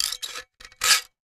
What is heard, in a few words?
Caulking gun being loaded and unloaded. Tools, Hand Clicking; Woodworking Carpentry, Pumping